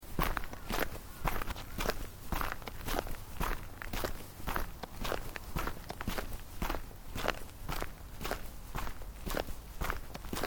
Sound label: Footsteps Boots Road Gravel Sequence; Footstep Foley; Footsteps (Sound Effects:Human)